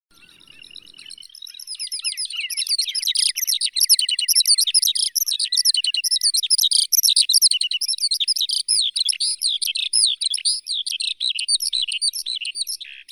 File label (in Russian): zhavoronok solonchakovii calandrella cheleensis, zvuki prirodi